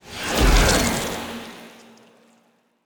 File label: ant ship stinger move; biogenesis